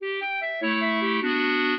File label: clarinet; Mozart